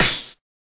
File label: SNARE, Volca; WallsVado Volca Sample Pack